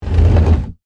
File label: Scrape Slide Wood, On, Wood, Surface; Texture; BlastWave